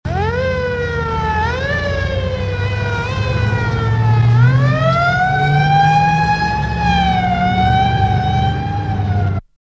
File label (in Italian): sirena polizia